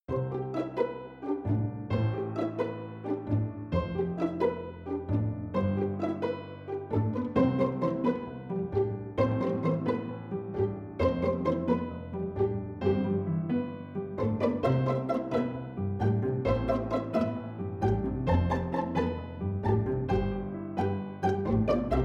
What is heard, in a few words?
String orchestra or Quintet piano accomp